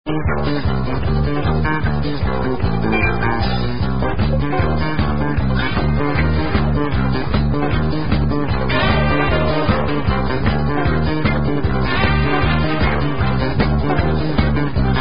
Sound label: Instrumental